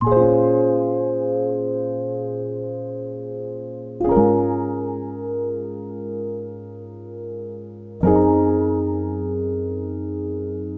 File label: Lo-Fi